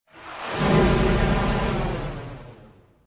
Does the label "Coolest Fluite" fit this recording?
No